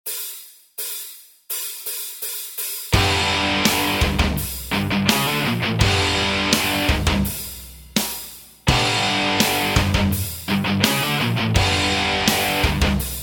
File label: bass metal, backing tracks